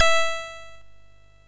Race, sid, MOS6581R3

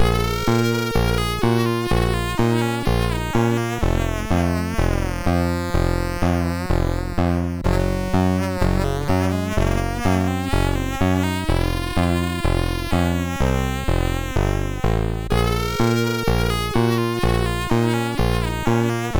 Creepy Tune, sid MOS6581R3

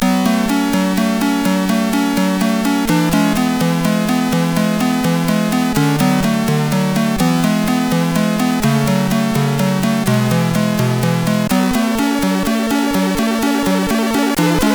Ballad, CSG8580R5

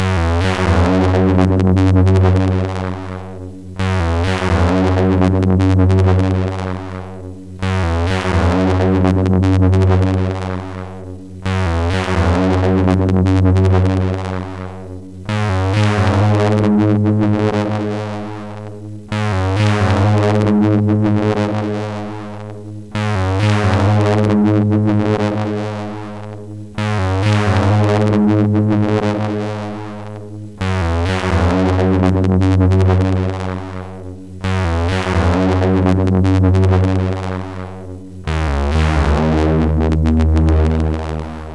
Big Echo, sid MOS6581R4

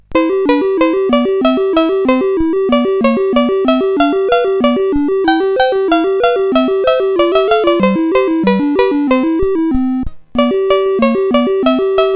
sid CSG8580R5, GAMES; Firequest

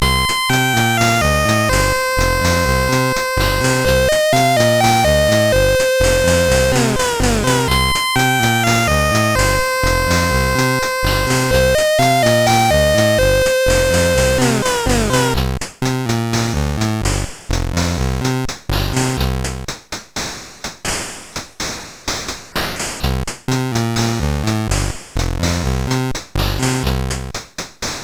CSG8580R5, GAMES; Street Beat

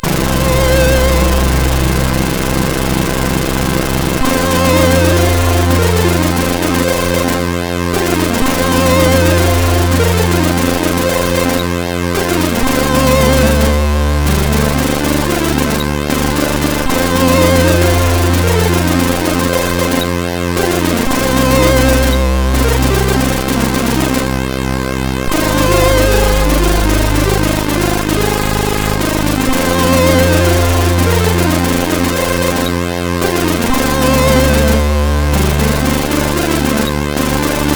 E-Load loader, CSG8580R5; hvsc

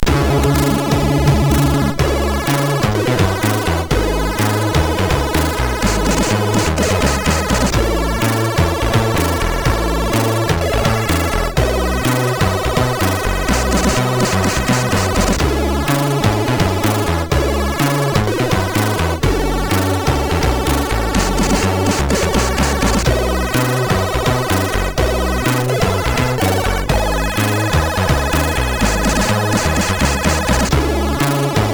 Hawkeye loader, MOS6581R4

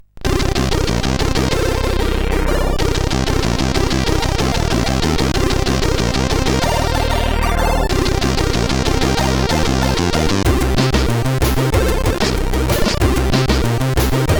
Funk, CSG8580R5

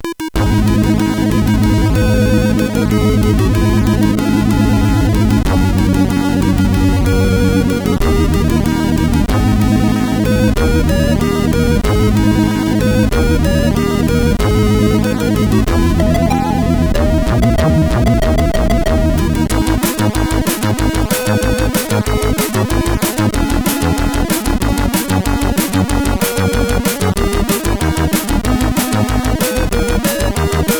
music, sid, CSG8580R5

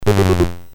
BASIC sid MOS6581R4, GAMES; Sword of Fargoal

